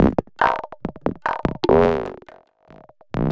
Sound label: TABLAS; Zero-G; Chemical Beats